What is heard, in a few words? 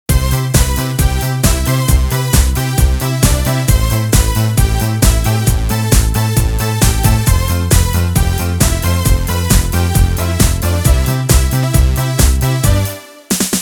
Octave Dance